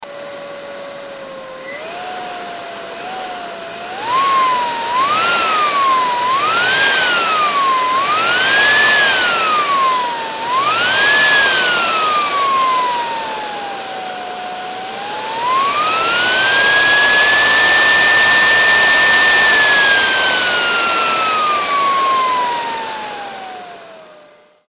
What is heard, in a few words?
Electric Turbine, power on off